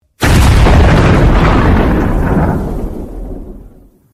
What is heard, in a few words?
bomb gun shot bang